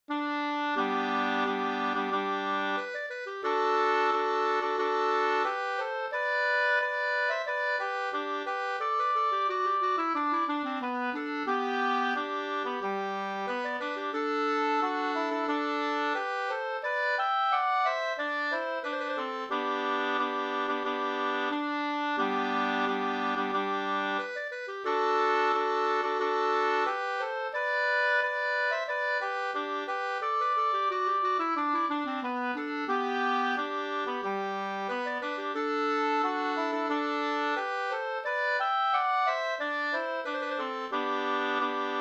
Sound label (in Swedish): brudmarsch; Vastermo brudmarsch